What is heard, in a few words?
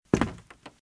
Footsteps - Wood - Creaky - Walk - Right